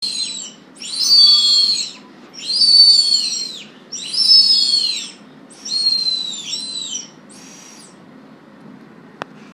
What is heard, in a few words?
red tailed hawk